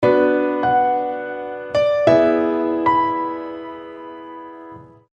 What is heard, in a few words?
Acoustic Logo; UBS